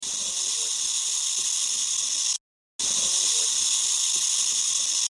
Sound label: burrowing owl Chick